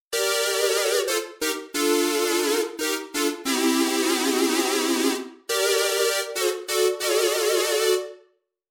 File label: Modual Lead